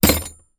industrial drill bit throw into plastic box with others in; zapsplat